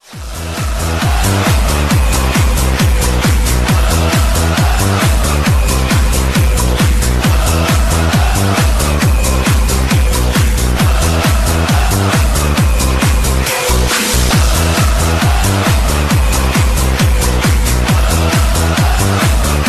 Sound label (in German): Torhymne; fc bayern muenchen